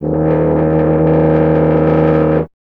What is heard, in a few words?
tuba D0, Tuba; Miroslav Vitous - Solo Instruments VOL-2